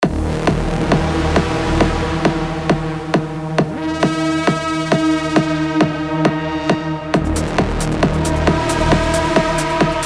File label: techno pads; revealed diva techno pads vol 1; revealed recordings